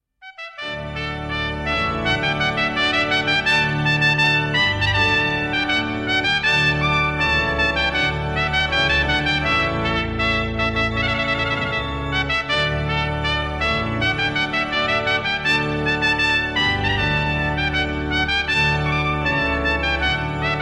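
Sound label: trumpet rondeau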